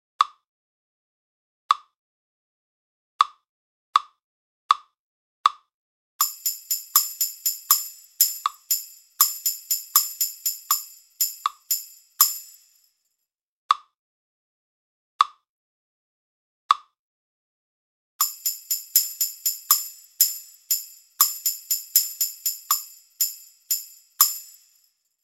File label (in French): TERNAIRE, Batterie Reggae; Buchmann; Batterie Reggae VIbrations